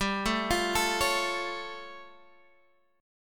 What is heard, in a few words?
A Augmented 7th G